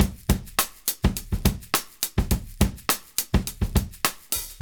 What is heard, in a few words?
LOOP 104